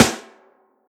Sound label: big room snare drum a sharp key, snare sound tuned to the A# note